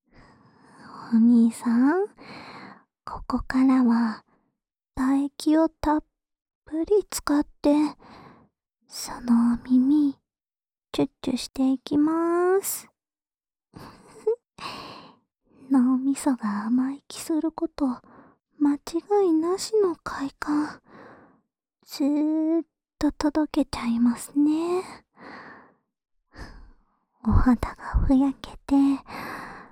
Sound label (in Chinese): 饱含唾液的舔耳 右, ASMR